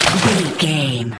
footstep water splash heavy wading

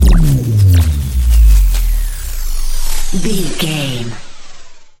sci-fi electric pulse power down

